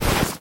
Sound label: move soft; scripted sequences, a1, safehouse